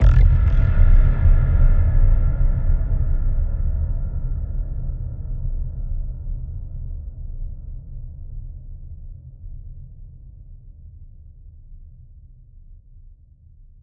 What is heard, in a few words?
One Shot Room Hit